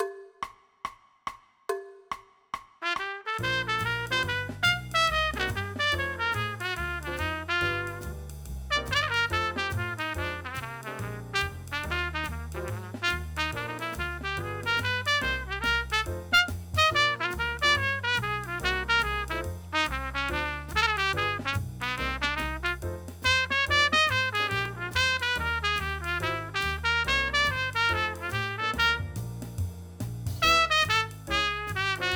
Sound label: Jazz Etude; ILMEA Senior